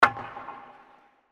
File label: puck hits glass